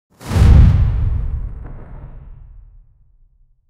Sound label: fire whoosh metallic; armor